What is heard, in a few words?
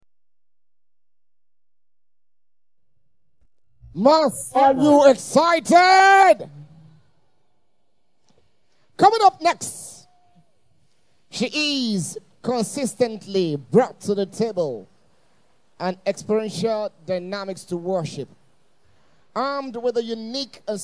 leads worshippers in the rain, vocals; Sola Allyson; at MASS 5.0 Concert Tour of Ibadan